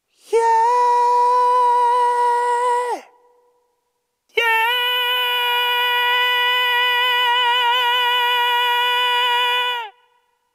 イエーイ！; ミックスボイス

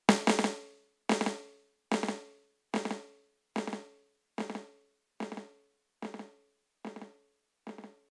スネア supertap; ディレイ

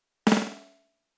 スネア ショートディレイ

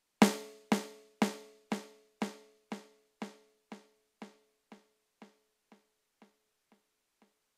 スネア ピンポンディレイ

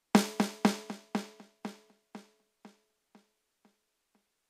スネア ステレオディレイ

